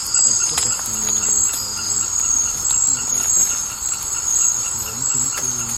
Lithodytes lineatus

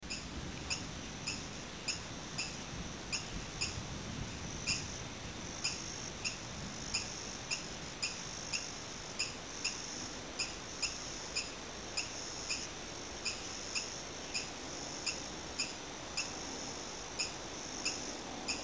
Adenomera andreae; guyane

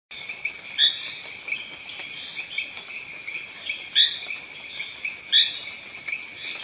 Adenomera heyeri; guyane

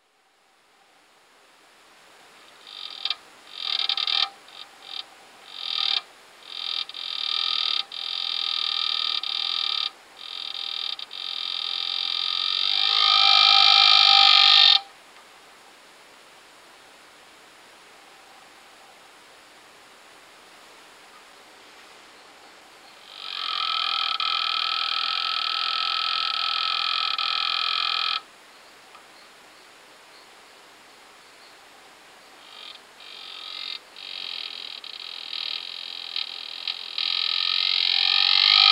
Pipa aspera

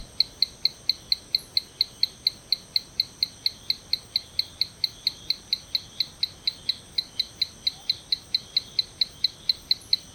Adenomera hylaedactyla; guyane

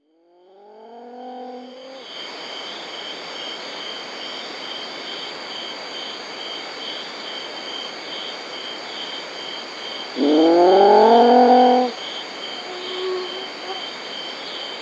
Hydrolaetare schmidti

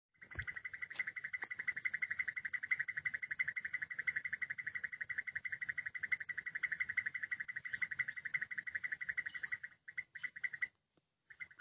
Leptodactylus nesiotus; guyane

